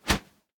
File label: Insects flip